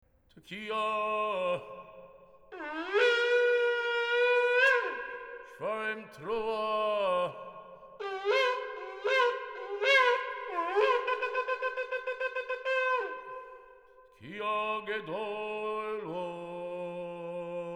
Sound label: Shofar Blasts